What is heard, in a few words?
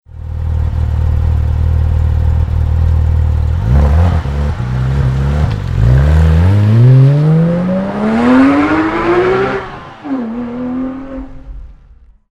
start, porsche road going rsr; porsche officially launches a road going rsr with its 911 gt3 rs 4.0